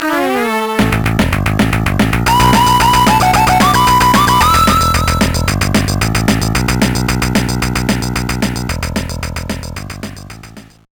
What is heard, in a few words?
Dixie Guitar; Donkey Kong Country 2 Diddy's Kong Quest; GBA